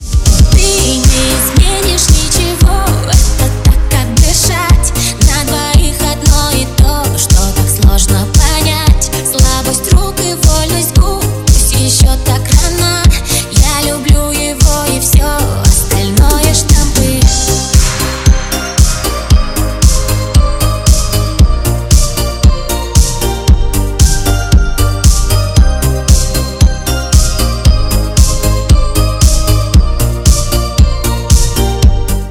remix italo disco

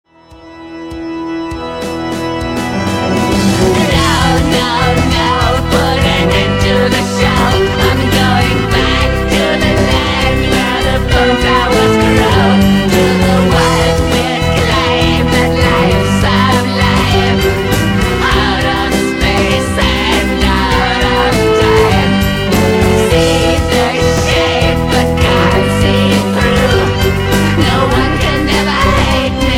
dark folk stil neo folk gothic rock

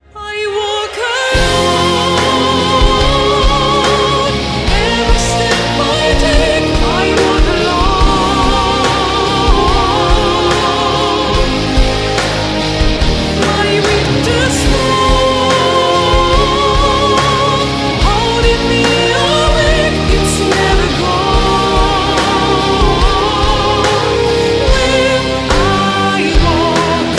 symphonic metal

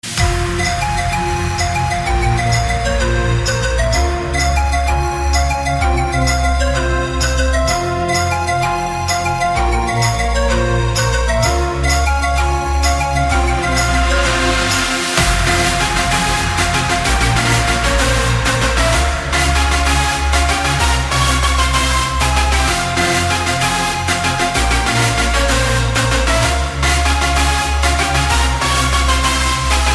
flute

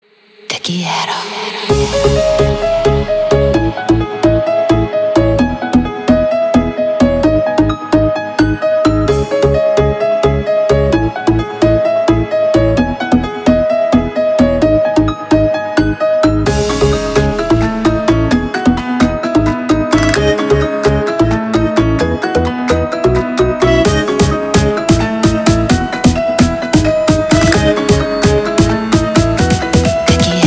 vocal mix

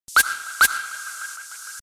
plucked sms

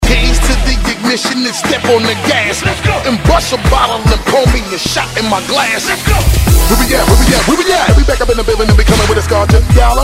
dubstep remix

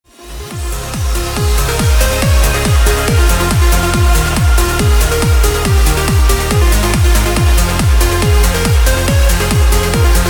uplifting remix

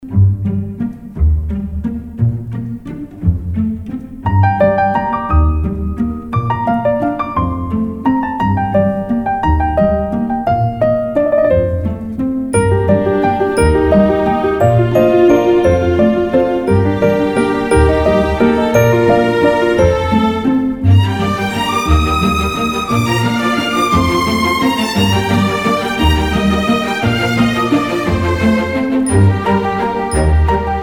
orchestra simple and graceful

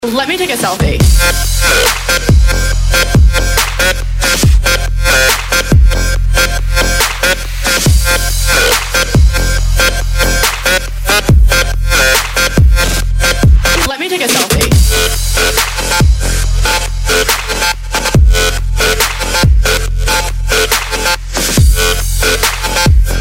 trap remix

